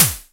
snare, electro

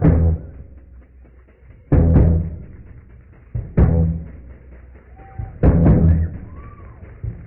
bass

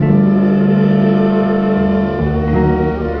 horns, Loops

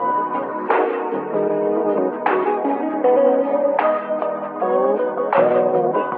guitar, Loops, Phonky

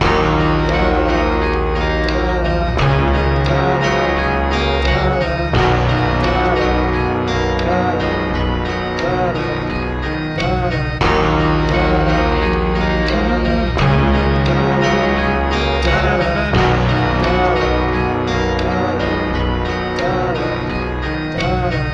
keys and voice, Sad; Loops

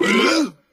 multi-burp; ooh ahh

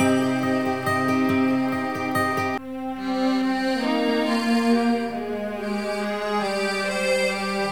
strings; Sad

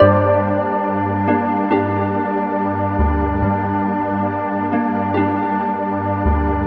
keys, jazz loops

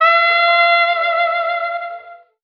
some undercurrents of string

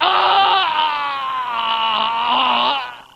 scream

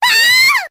scream girl; ooh ahh

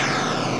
game, Zaps and Beeps

